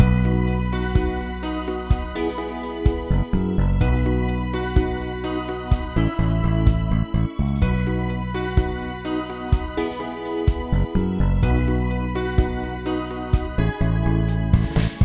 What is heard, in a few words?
BGM Normal